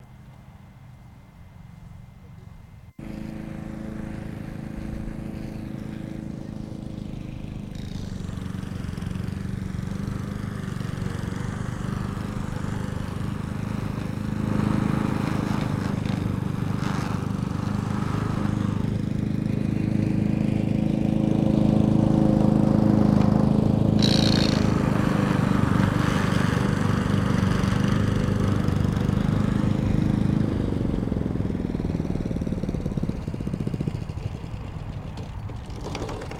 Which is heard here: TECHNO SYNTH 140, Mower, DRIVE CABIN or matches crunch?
Mower